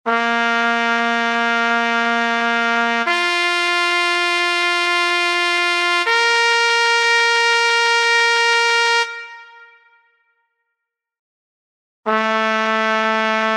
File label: seven positions